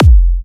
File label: Kicks F; markus hakala